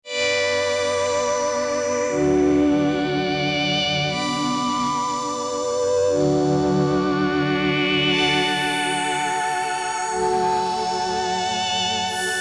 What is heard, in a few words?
Bm 120 Korg chords